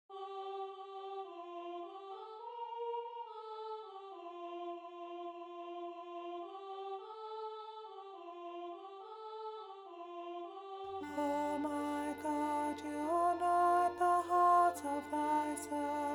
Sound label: alto